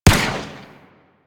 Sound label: Arch Burst Gun Shot, Weapons; DE; Warframe; Archwing